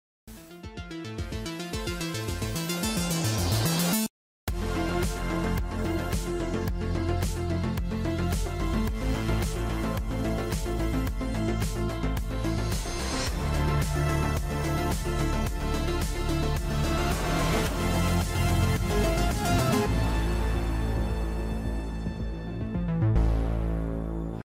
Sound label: english love romantic ringtone